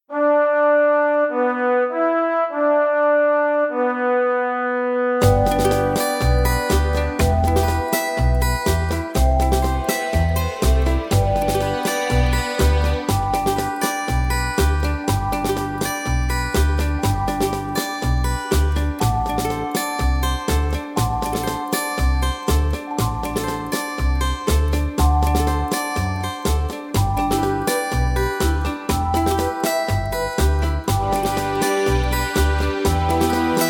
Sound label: G No Backing Vocals, backing tracks